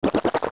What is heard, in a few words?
Split; Bari; zelda; OOT